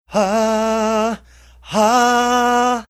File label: singing haaah haaah